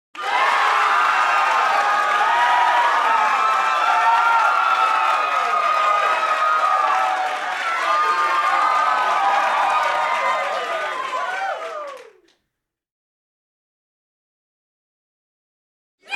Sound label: sound of cheering and screaming